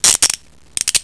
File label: cuffs